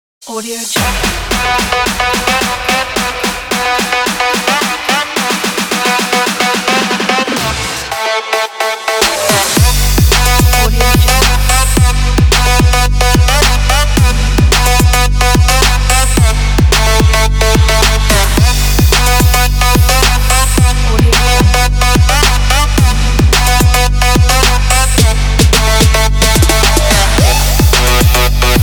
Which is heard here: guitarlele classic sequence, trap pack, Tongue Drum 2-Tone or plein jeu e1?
trap pack